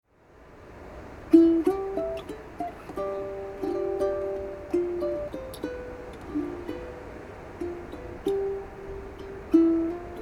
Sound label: uke waves